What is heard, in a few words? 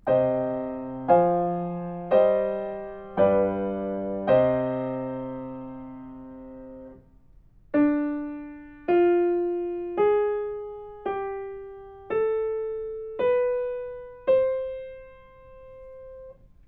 melodic fragments